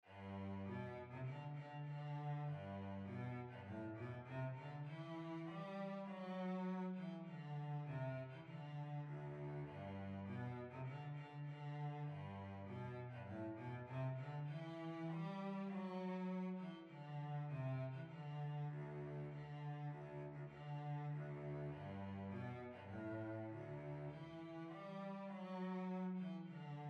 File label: Polketta, Violoncello